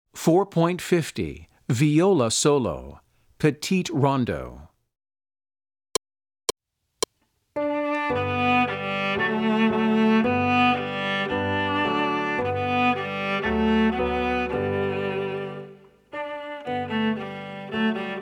Viola Solo

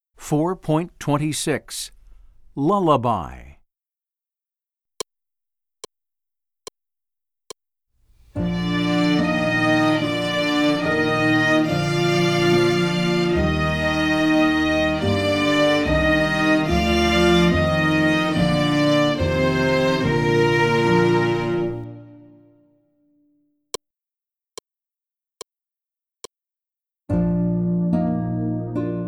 Lullaby

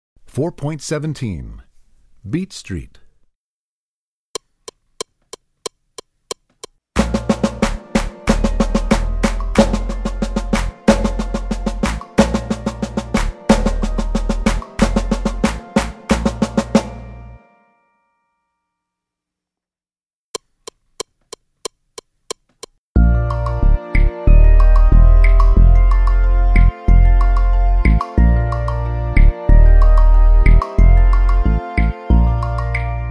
Beat Street, Percussion; Percussion Book 1

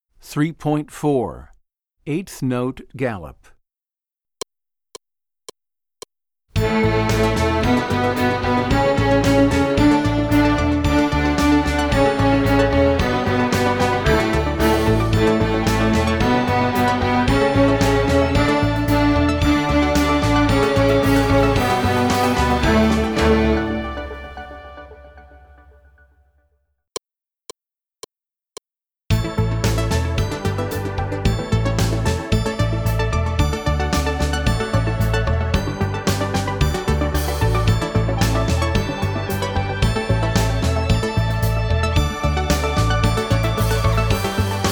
Eighth Note Galop

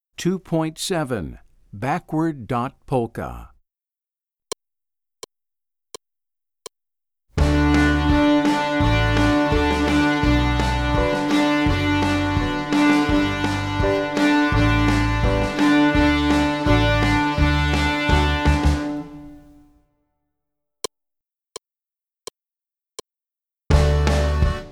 Polka

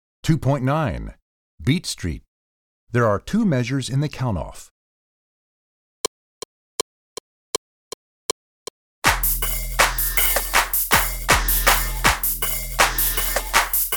Beat Street, Percussion; Percussion Book 2

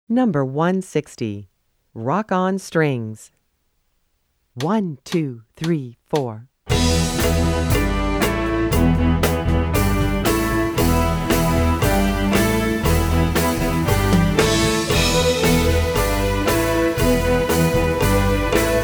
Rock-On Strings; New Directions for Strings Book 1

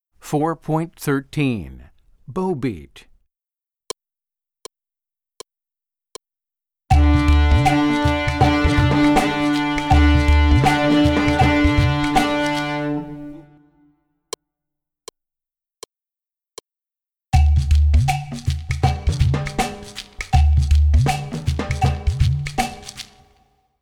Bow Beat

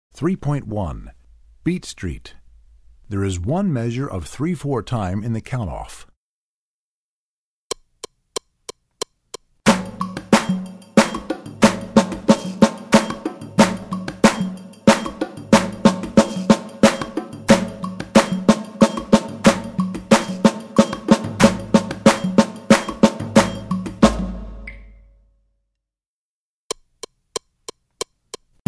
Beat Street, Percussion; Percussion Book 1

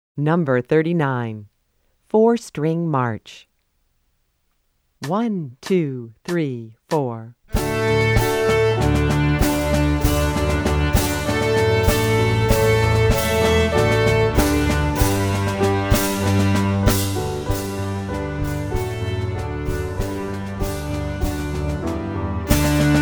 Four-String March

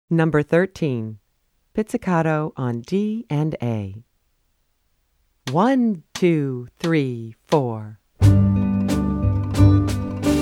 Pizzicato On D And A

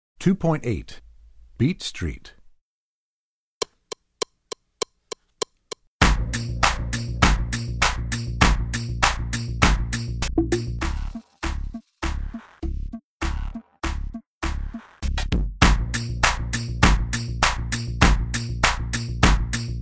Beat Street, Percussion; Percussion Book 1

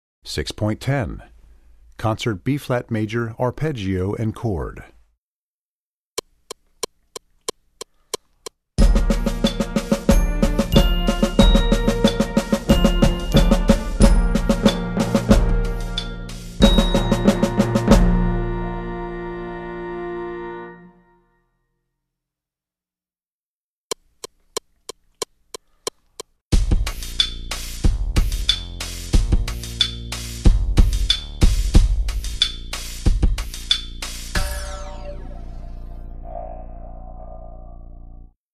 Concert Bb Major Arpeggio; Percussion Book 1

